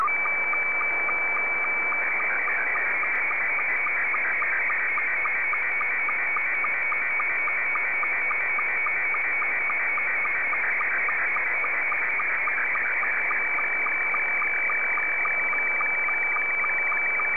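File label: SSTV, Scottie 2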